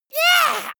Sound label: monster assault high; schreie